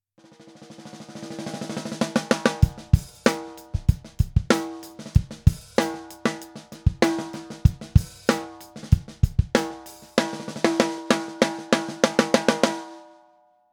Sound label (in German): Maple mittel offen